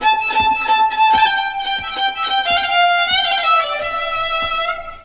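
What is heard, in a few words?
Balkanish Traditions violin; USB Soundscan vol.63; AKAI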